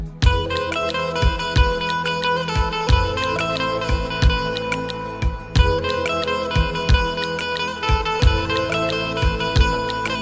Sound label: instrumental song ringtone